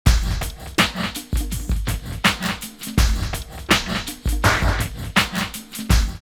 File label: drumloops OldSchool 82; X-Static Goldmine; 90 s Sample CDs